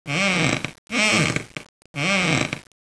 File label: creak